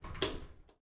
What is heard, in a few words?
Lift Door Open; Game rip; MiSide